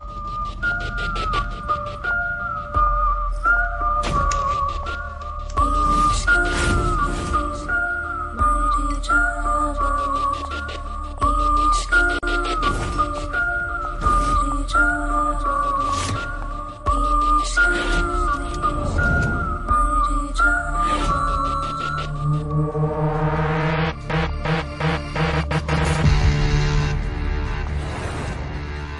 Flute Version

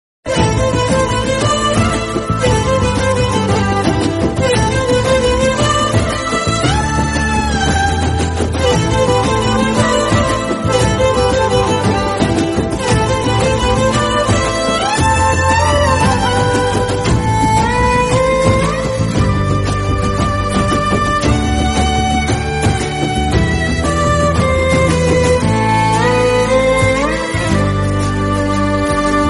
Tamil Romantic - Bgm

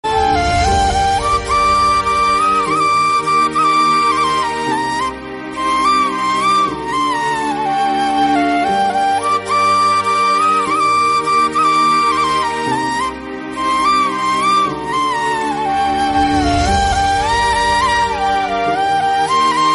Flute, ringtones

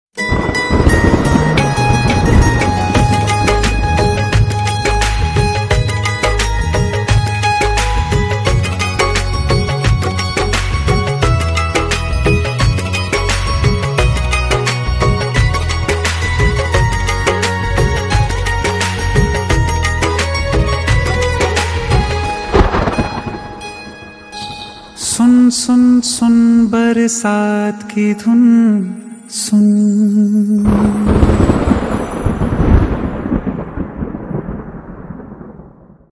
Ringtone

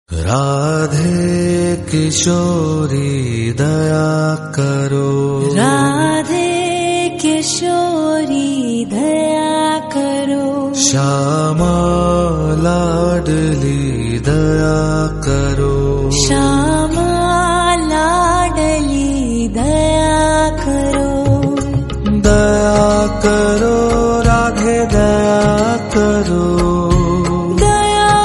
Instrumental

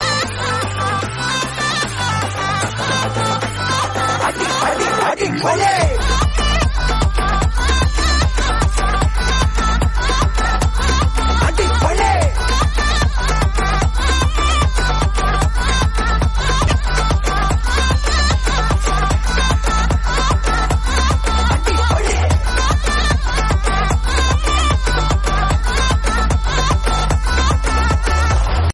Instrumental Bgm; Tamil Song

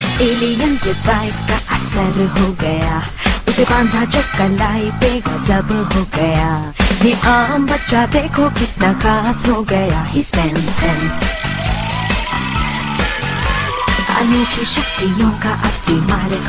Theme Hindi Song

